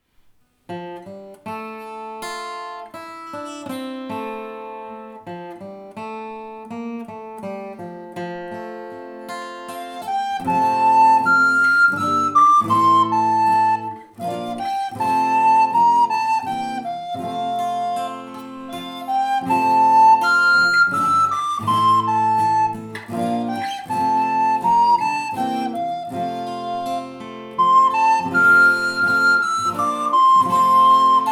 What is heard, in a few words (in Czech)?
flétna + kytara; Letní růže; Flautoškola 2 – METODICKÉ NAHRÁVKY a DOPROVODY